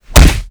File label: hook hit; Rust